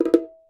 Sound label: battery countdown; Swapperoo; Android iOS mobile Switch Windows